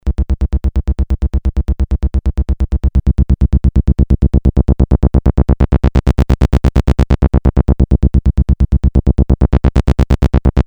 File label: korg m1r synth